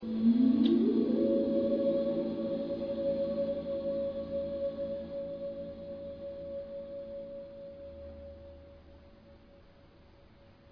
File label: palme gliss